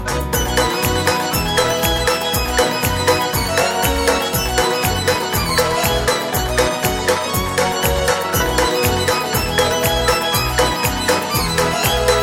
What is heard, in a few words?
Bluegrass